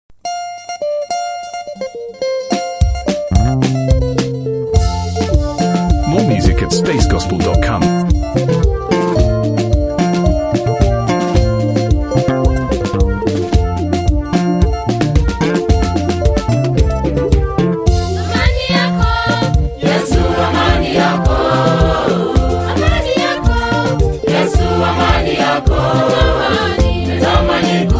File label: protestant trainees choir; Kenya Prisons Staff Training College